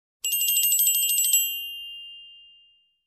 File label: Handbell ring